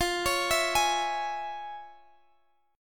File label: F Minor Major 7th Sharp 5th, Guitar Half Step Down, chord